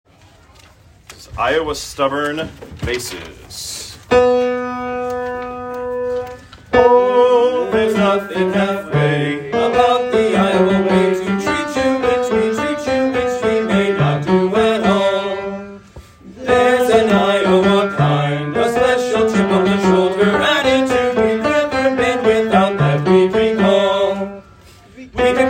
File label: Bass, vocal tracks; Rehearsal Recordings